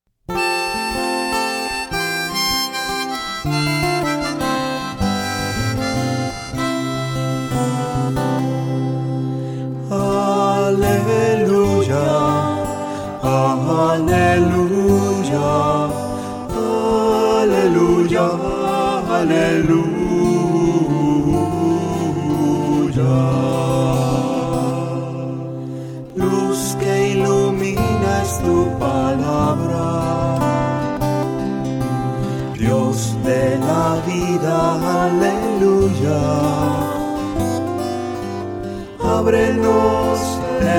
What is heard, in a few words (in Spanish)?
misa canto aleluya